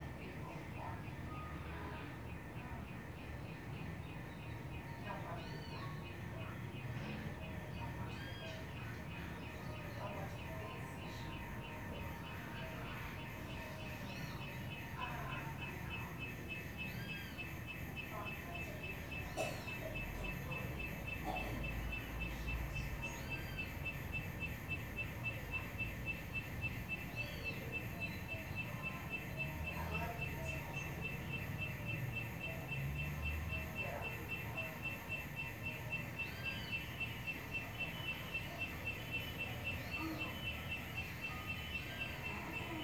Ambiencia casa fundos tv com novela, cigarras escandalosas, passaro; cachorro, trans; ambiencia domestica

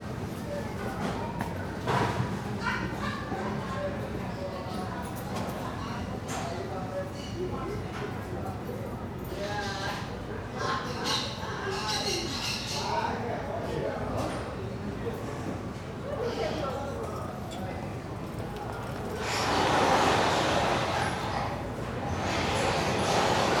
Portoes de loja sendo fechados mercado goiania com pessoas e passaros; portas

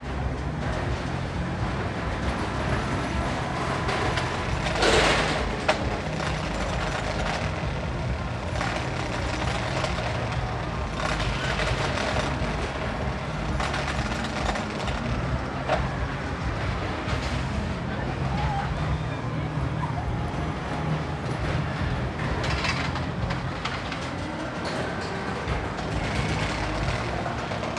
Montanha Russa Subindo a Rampa e Descendo no Loop; maquinas

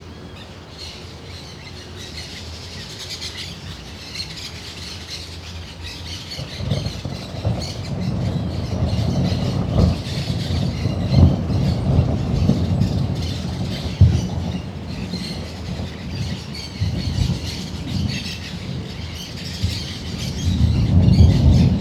Trovao longo na varanda com muitos passaros e transito

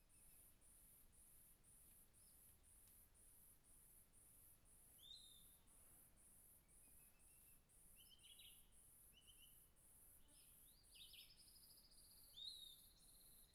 Grilo passaro fim de tarde mata fechada; insetos

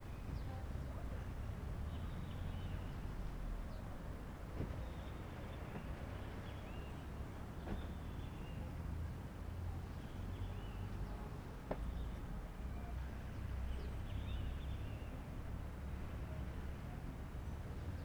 Ambiencia estacionamento unb com porta de carro, passaros e alguns carros; universidade de brasilia

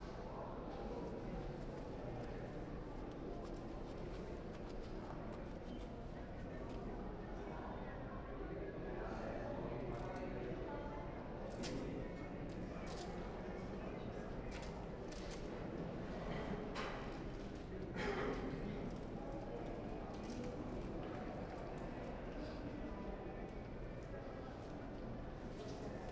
Ambiencia entrada salao verde camara dos deputados com pessoas; e fita adesiva; ambiente interno; colecao sonora do cerrado

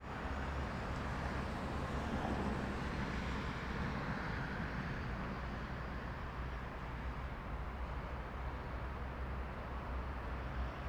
Rodovia movimentada Eixo Rodoviario Carros Rapidos; transito

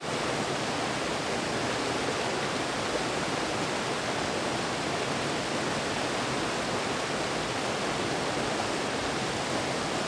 Pequena Cachoeira na Trilha Simao Correa em Surround; Cerrado

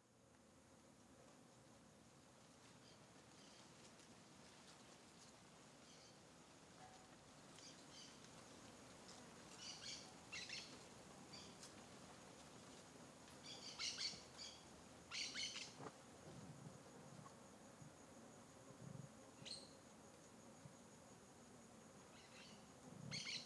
Ambiente mata pouco fechada com grilos discretos e muitas moscas; ambientes naturais

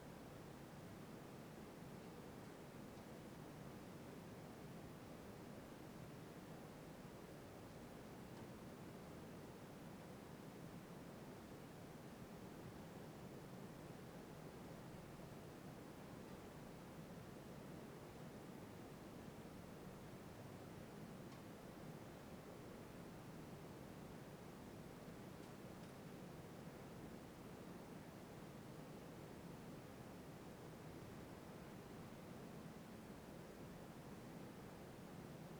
Interior de caverna silenciosa com morcegos voando; ambientes naturais

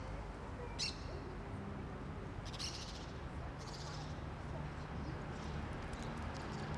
Joao de Barro Vocalizando e Voando em Quadra Comercial; aves; da Asa Sul

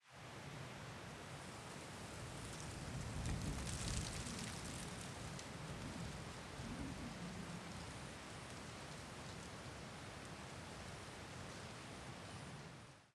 Rajada de Vento em Folhas Secas

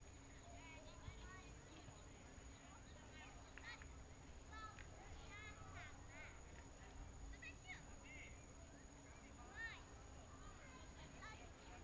Ambiencia parque das garcas em fim de tarde com pessoas, grilos e transito; longe; ambientes naturais; Coleção Sonora do Cerrado

